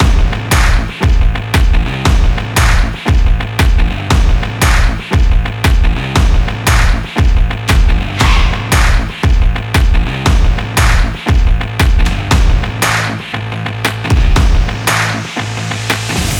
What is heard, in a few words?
stomping extreme sport loop